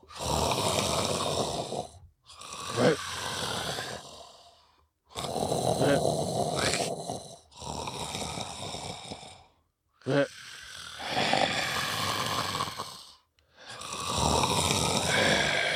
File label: Colosseum Sleep Loop